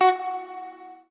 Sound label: Guitar & Bass; Quantum Leap; 90's Sample CDs